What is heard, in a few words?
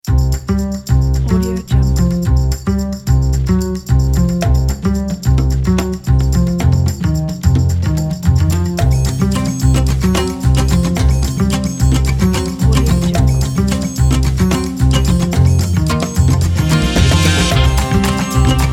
that funk